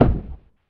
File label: body drop; foley